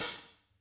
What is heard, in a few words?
hats, Interactive Drum Kits; Sonic Reality